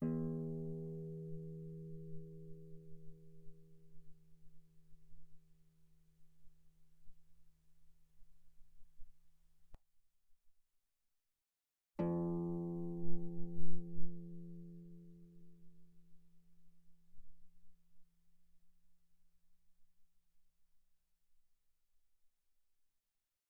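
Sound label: Guitar pianissimo sul E E2 B2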